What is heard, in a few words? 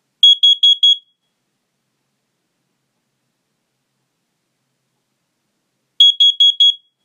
CO Alarm